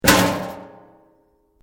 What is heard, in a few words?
METAL BOX; Metal (Sound Effects:Materials)